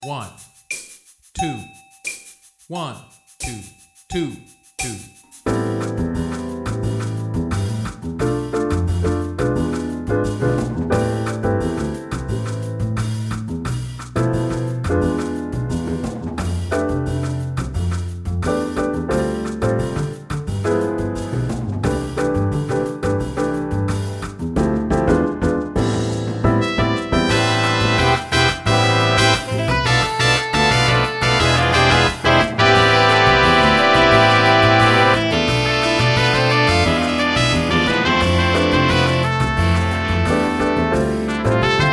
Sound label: aux perc play a long latin; Jazz-Band; All-State